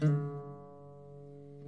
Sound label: luth, GUITARS